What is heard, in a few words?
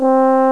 French Horn C4; Indiana Jones Demo; iMuse